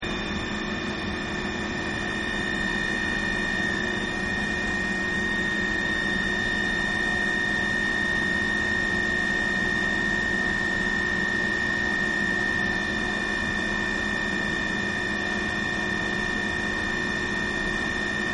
Generator Hum, Underground Garage Generator Hum Loop; Generator Hum 02